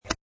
Golf Drive, High quality stereo recording of a golf drive; Sports